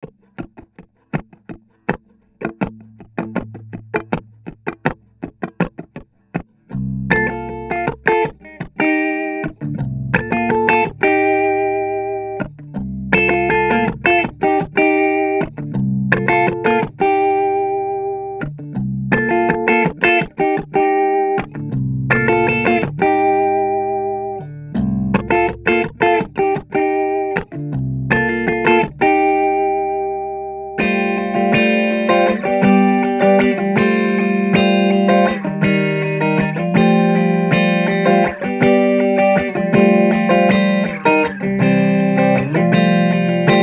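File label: Riffy Strummy guitar in C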